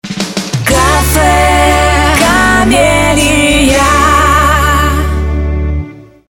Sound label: Jingle